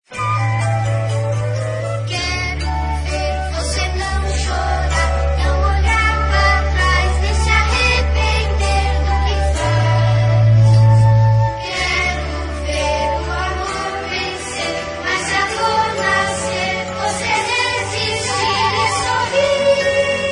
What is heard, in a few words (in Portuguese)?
Coral Infantil; Natal E Ano Novo